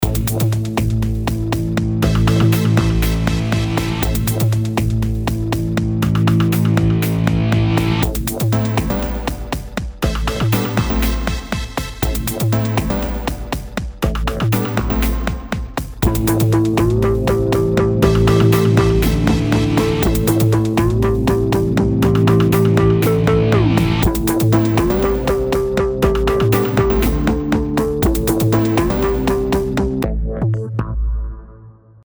Jingle On Tv

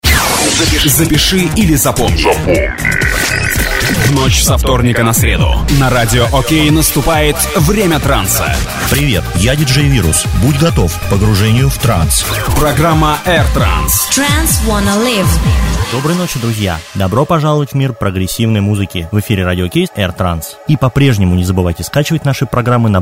Radioshow R-Trance

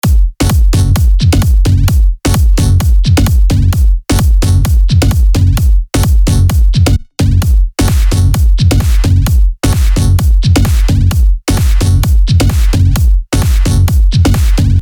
DrumLoops & Percussion

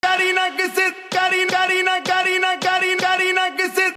Trap

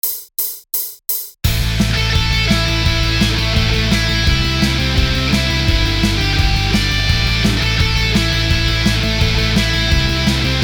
Instrumental Punk Rock cover

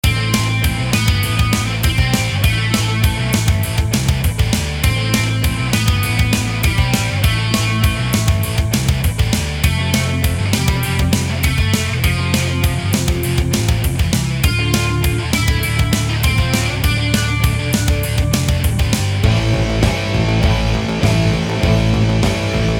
Metal

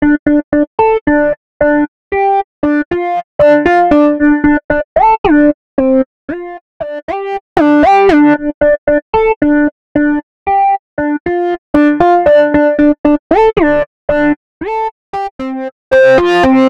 Melody

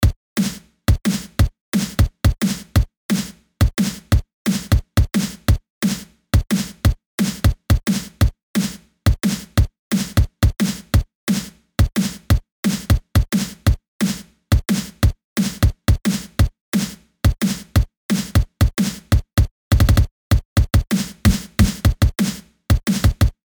loop d n b

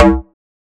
FM Bamboo Bass C#; FM 8; SF FM 8

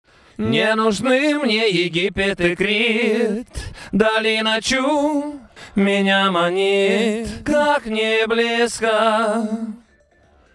Acapella

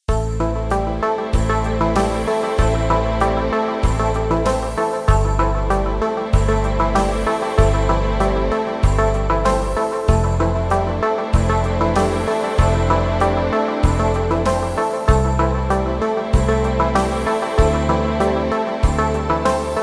Inspiring Flow Background Music

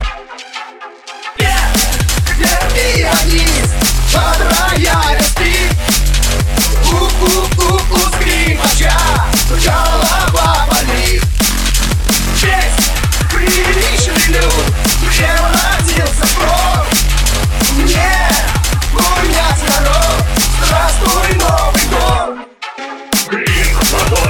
DNB

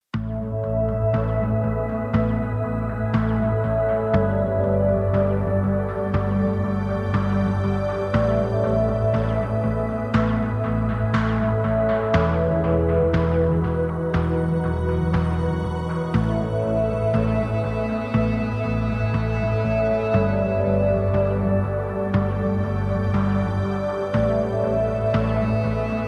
Atmospheric Motion Background Music

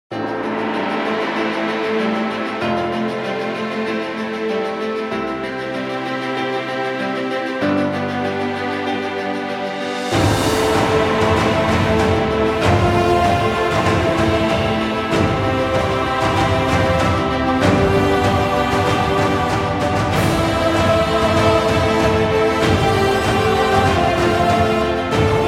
Epic Brave, Epic Music

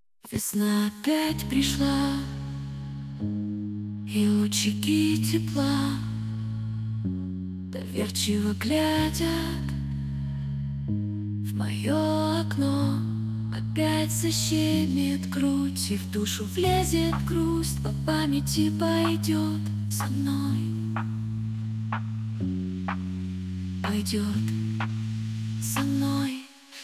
Techno Remix

